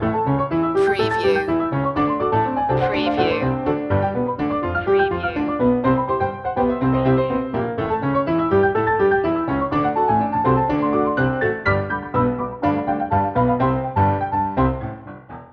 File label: old saloon loop